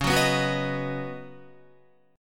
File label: C sharp 7th Suspended 2nd Suspended 4th C sharp Guitar; strummed; chord; 8,9,11,11,11,11